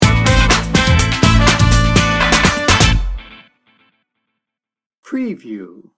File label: funky shoes sting bumper